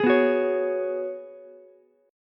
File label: G sharp Minor 11th, Ukulele C6; strummed; 13,11,14,16